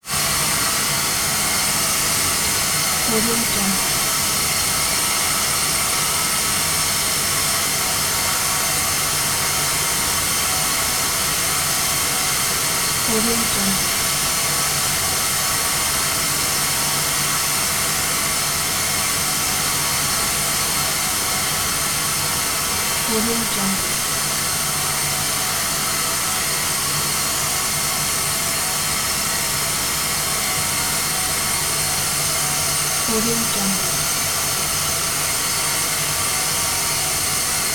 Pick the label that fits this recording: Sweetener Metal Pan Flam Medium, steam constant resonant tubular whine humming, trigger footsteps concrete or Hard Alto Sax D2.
steam constant resonant tubular whine humming